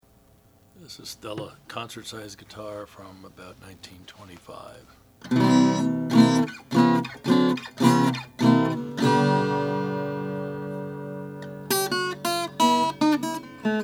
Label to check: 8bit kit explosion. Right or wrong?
Wrong